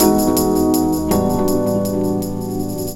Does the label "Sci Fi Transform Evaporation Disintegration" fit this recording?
No